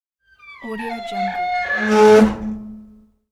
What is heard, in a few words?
iron door creaking